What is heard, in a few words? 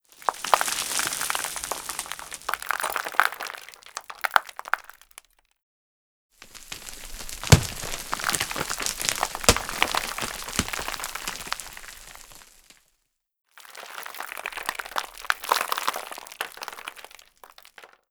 small rock debris loop